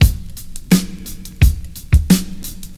86 bpm drum beat c key

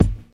thumpy bass drum g key

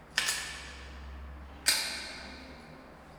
key on wood plate sound natural hallway echo; sound effects

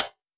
sharp hat A sharp; Mono

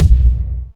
low kick drum single shot a sharp key

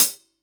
smooth hi hat closed sound g key

